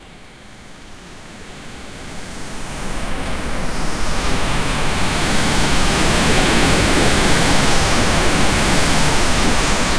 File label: scifi water; scifi water waters lash; city noise